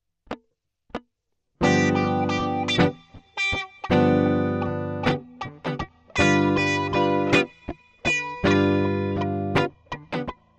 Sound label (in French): Authentique Guitare Blues